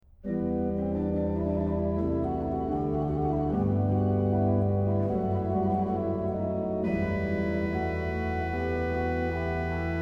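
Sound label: Wenn dich Unglück tut greifen an, organ; BACH; BACH ORGAN WORKS; Chorale Preludes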